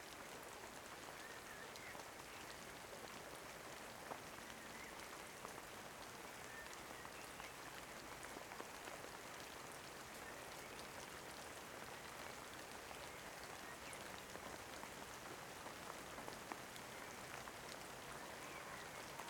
Raindrops Sleep Relaxing Sleep Sound, Relaxing by the Water